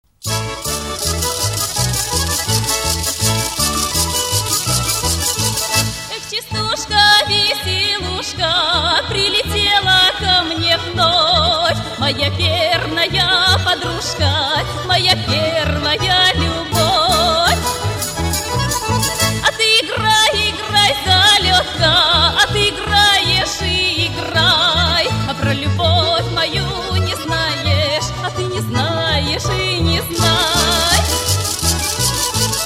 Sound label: folk; Масленица музыка